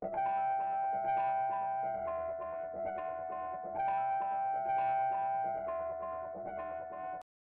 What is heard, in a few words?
133 BPM E Min Distorted Bells; Loop Stems; Cymatics; 2022 Melody Collection Platinum Expansion - Pt 1